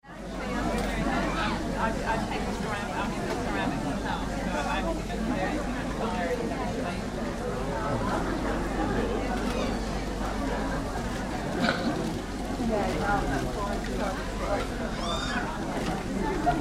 Market Crowd Interior, Large busy craft market background; Crowds